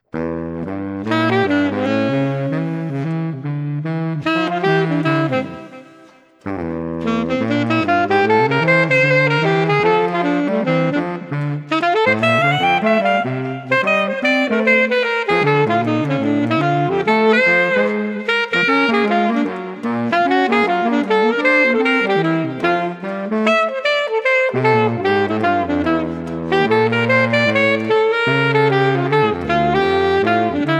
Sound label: Street Baritone Alto Lively 150